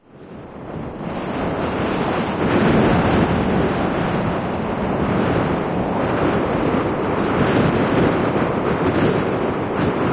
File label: wind gust strong, ambient; Left 4 Dead 2; Source Engine